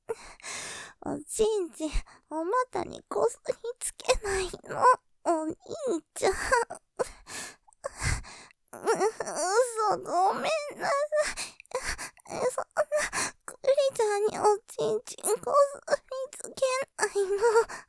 喘ぎ; おちんちん、おまたにこすりつけないの(´>ω<｀); ループで楽しむ！シェフの気まぐれ抜き抜きコース; モノラル

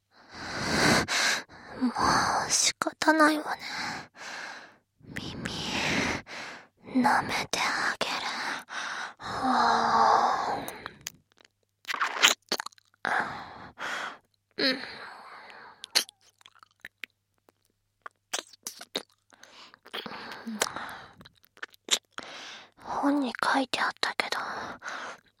おまけ耳舐め, ASMR

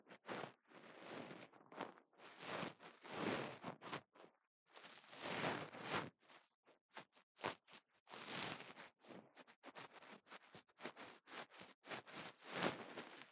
梵天左

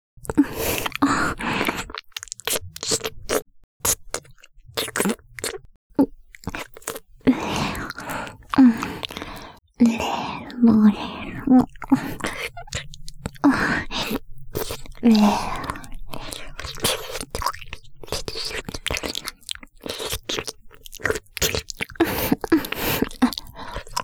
耳舐め, ASMR; ＳＥなし

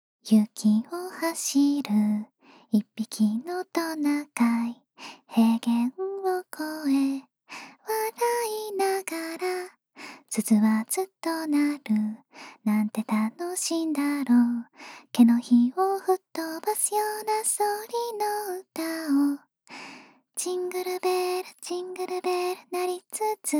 ジングルベル, 耳もと ささやき・子守唄; 「１０週目」

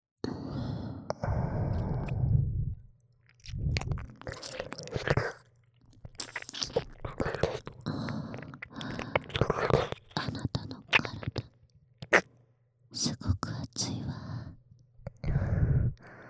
耳舐め + マッサージ; セックス クラブ; 性教育バイノーラル音声

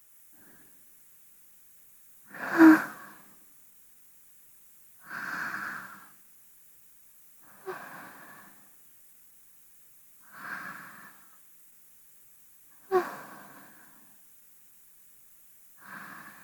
寝息（バイノーラル編集）; ミミカノ vol4 寧々; 効果音小差分

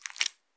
膣内射精後, 引き抜かれた瞬間; ■挿入or引き抜く

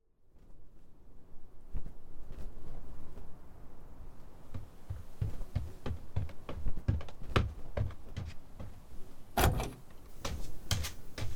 お耳ペロペロ 耳舐め左右 対面座位 耳奥感; 耳奥power

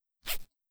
ジャッッ

